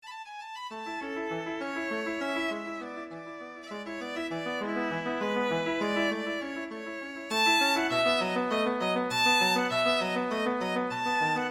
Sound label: minuet, violin; boccherini